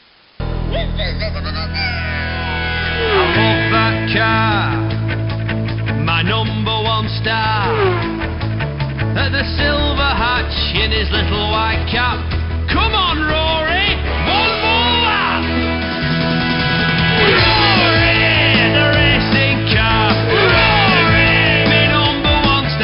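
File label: Xylo, xylophone; Roary the Racing Car; NDS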